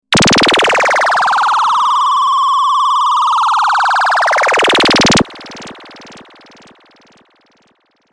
Sonic Attack, Rapid electronic frequency modulation with delayed fade.; Zaps (Sound Effects:Sound Design)